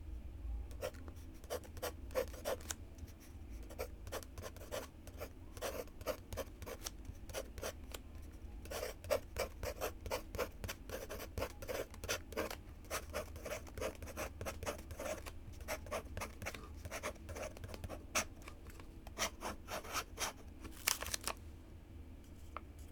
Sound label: music listen